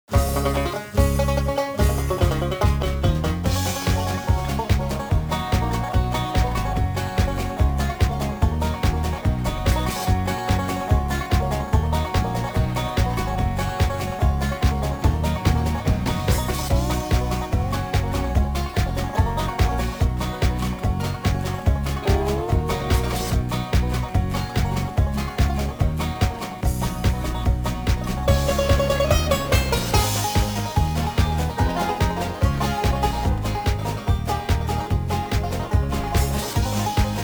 Theme Song (Instrumental); Timmy Time (DS) (gamerip) (2011); Minigame Theme 4

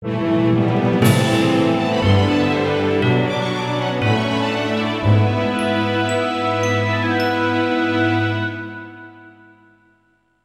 Lose; Dragonseeds; PS1

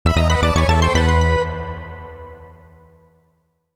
Life Lost [Roland LA]

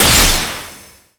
Enemy Temple Shield Break

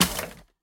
Weapon Electronics

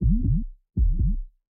Health Explosion Stinger

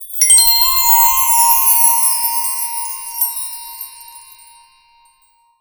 UI MagicItem Tell

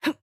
Attack Junk; Jackie

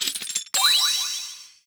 Stage Round Unlock; Se Sys Stage Round Unlock

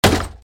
slotspawn; SFX Slots

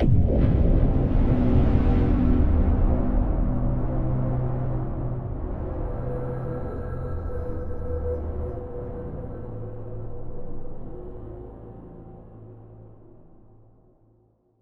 Stinger Kiln; Peak (2025)

